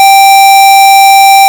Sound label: square